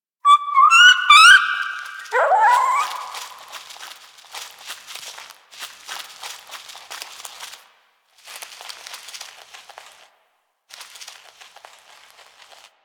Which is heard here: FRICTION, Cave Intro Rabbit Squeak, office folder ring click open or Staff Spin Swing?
Cave Intro Rabbit Squeak